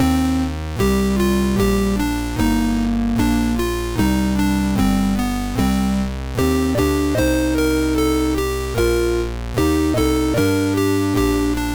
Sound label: Heavy Tune sid MOS6581R3; hvsc